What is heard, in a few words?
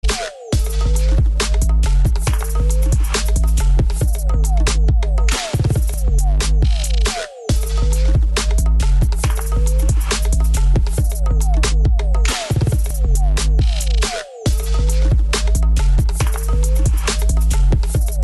Clean Groove